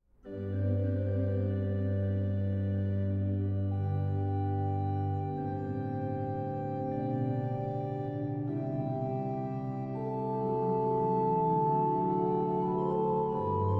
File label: Toccata; Congedo Toccata settima del ottavo tono